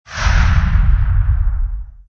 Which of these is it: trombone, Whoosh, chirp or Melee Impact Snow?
Whoosh